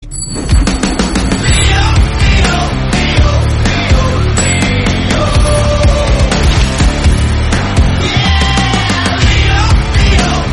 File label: Promo Tamil; Thalapathy 67 Leo